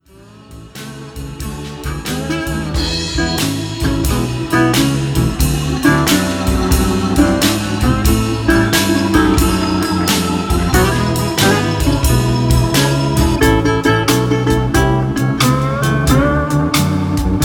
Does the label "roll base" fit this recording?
No